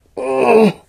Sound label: launch pain; elivance